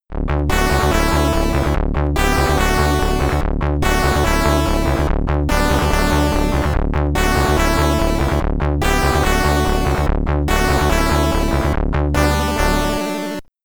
Landing Theme Triumph (SID Stereo); Sky Shark; Commodore 64

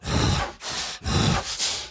Enraged Breathing Loop; The Town

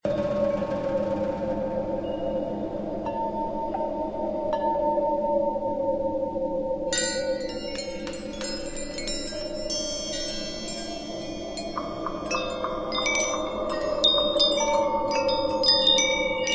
On Ice Dusk Ambience; Finn

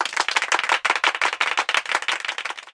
Ambient Sound; pangya fantasy golf pc

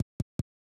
Tile Match Rhythm; Shanghai 2 Dragon's Eye; snes

